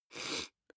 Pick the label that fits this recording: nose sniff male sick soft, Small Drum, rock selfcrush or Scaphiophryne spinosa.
nose sniff male sick soft